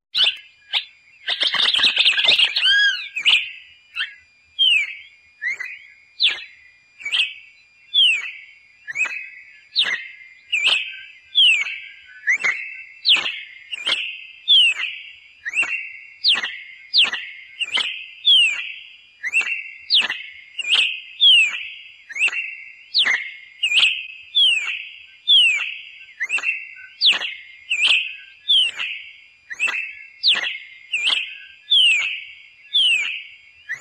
Canto Dos Passaros Canario Da Terra

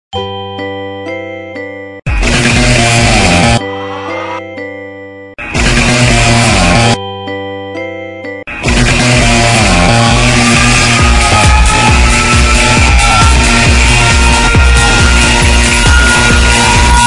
despertador de bebe techno

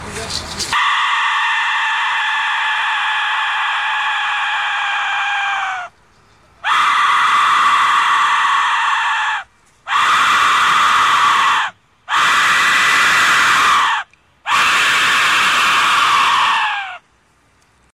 gritos de desespero

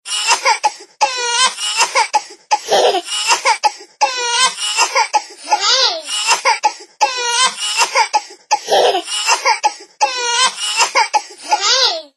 bebe chorando e rindo

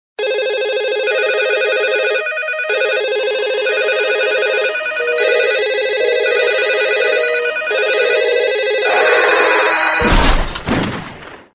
diversos toques

